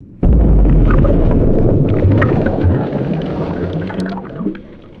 muffled underwater explosion with churning bubbles; explosions